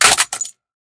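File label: rifle drop glass; STALKER; IWP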